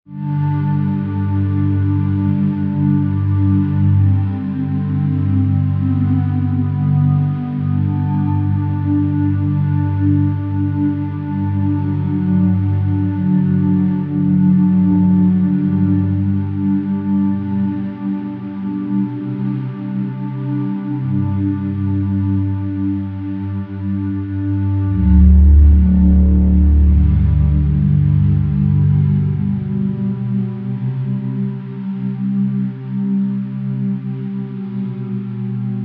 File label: Underscore PAD; Worship Flow